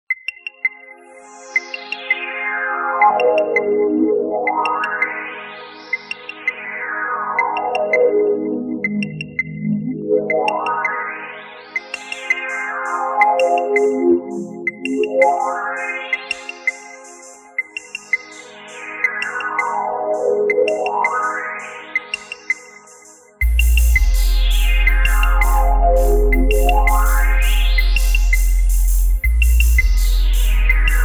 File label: Beat